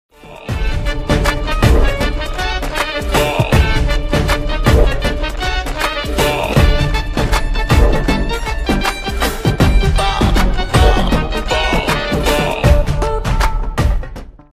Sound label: bgm song ringtone chill opera; Soorarai Pottru